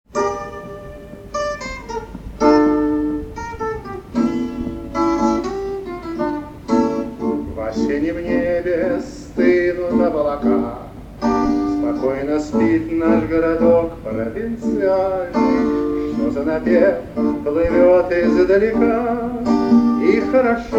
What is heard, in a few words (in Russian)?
Sentimentalnoe Tango; na svoi stihi; o lubvi